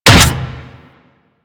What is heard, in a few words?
Step Metal; Warframe; machines; Jackal